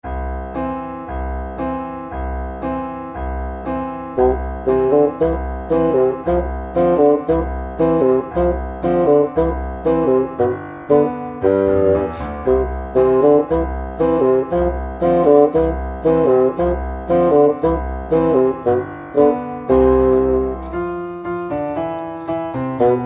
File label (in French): polka basson; czech